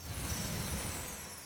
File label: sparkle